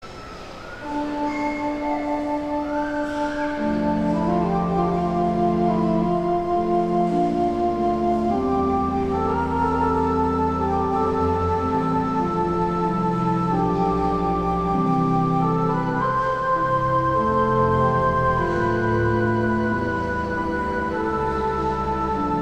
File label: Organ, Chorale Prelude; Dietrich Buxtehude; 'When Jesus Went To Jordan's Stream'